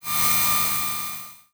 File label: Multimedia Internet CD-Rom Flash Radio Element